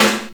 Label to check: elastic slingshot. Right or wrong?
Wrong